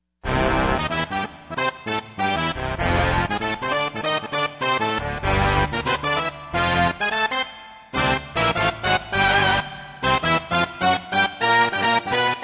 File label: Big Band, Yamaha TG55; Factory Presets